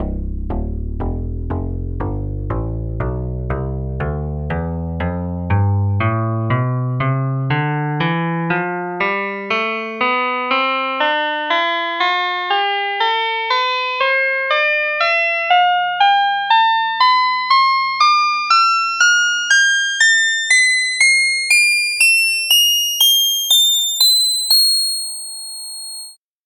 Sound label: jazz guitar tonleiter